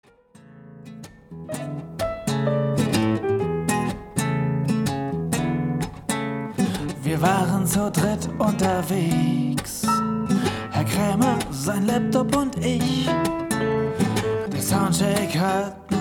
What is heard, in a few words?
Blues